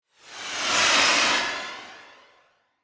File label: Whoosh Rocket, Small, Rocket, Pass, By; Whoosh Sound Effects; SoundEffects-BlastWave